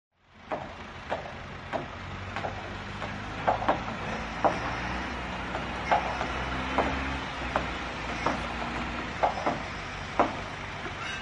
Am thanh cong truong dang xay dung

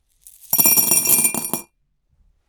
dong xu roi trong bat su

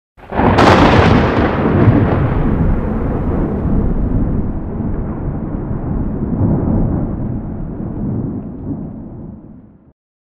Tieng sam set

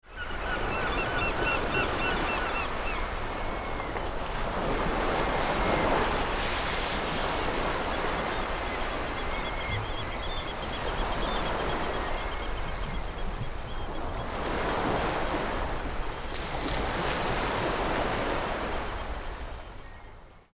chim hai au keu va tieng song bien

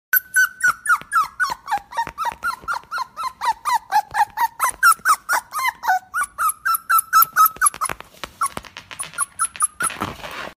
Tiếng chó con kêu

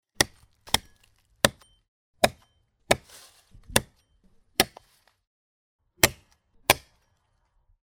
Tieng che cui coc coc

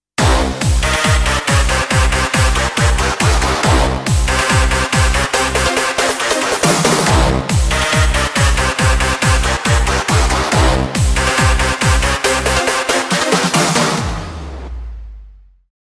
nhac chao mo man phong cach electro house

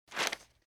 Âm thanh lật nhanh trang giấy

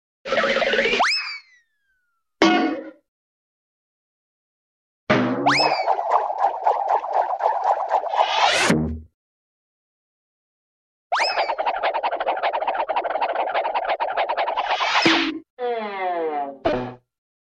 Am thanh va cham hoat hinh

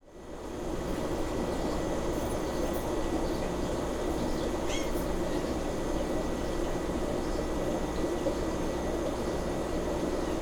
Am thanh moi truong tu nhien trong rung

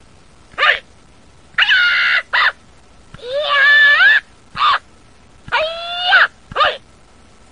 het khi dau vo karate

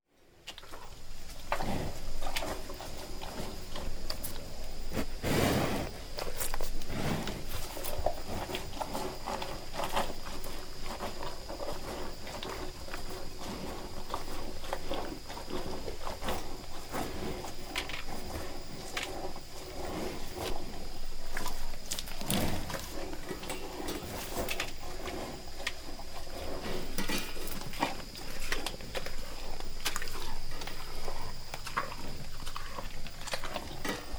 Tieng gia suc trau bo an co

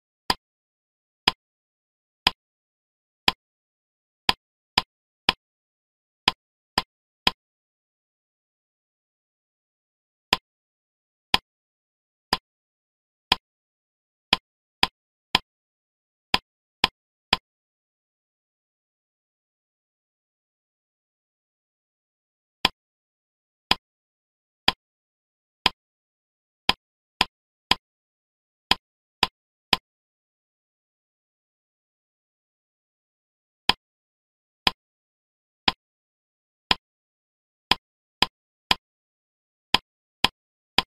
Tiếng gõ song loan theo tiết tấu

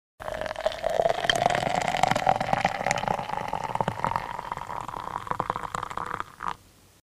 Tieng rot nuoc

